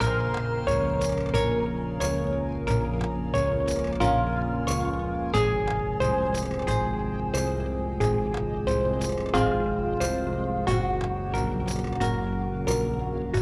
Lose BGM; 7 Sexy Sins; android

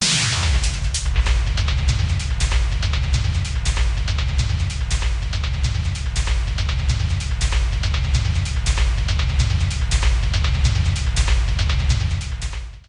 Background Of Change Set Up, pinball; Indianapolis 500; bally pinball

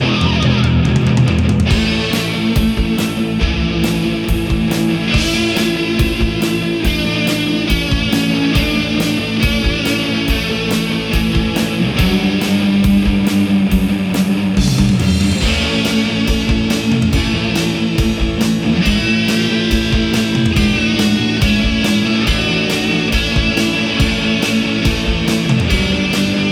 Guitar Golfer; WHAT THE GOLF? Soundtrack (2021); GuitarGolfer Master 1

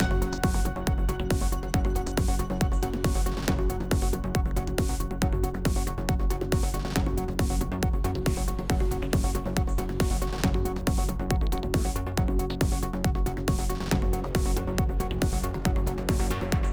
Alert State 8CH; Anchor

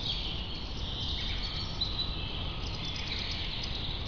General Wildlife Loop

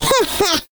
Classic Miss Sound; Jolly Battle

